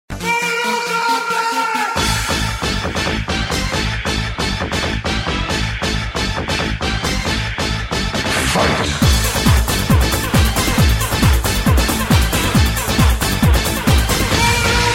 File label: techno; mortal kombat